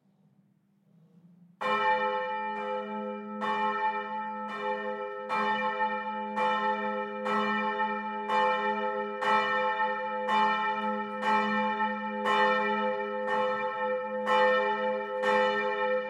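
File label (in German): suedturmglocken, Glockengeläut der Stadtpfarrkirche; Stadtpfarrkirche St. Peter und Paul; Lindenberg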